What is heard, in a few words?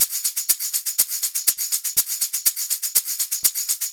Tambourine